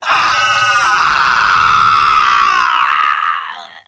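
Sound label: scout pain critical death; vo; tf2; mvm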